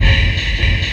BOILER ROOM, Synth FX Misc

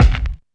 DM5 Kick, Alesis DM5 drum machine

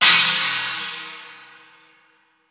China Cymbal; Roland BK-5

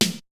Tom, Drums; ZERO G - Datafile DISC 1

